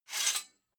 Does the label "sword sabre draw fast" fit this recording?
Yes